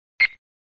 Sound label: Sound SFX, flipper; sttng